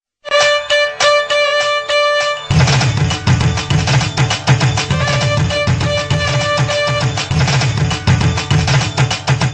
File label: Tamil Music